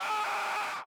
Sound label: Death Voice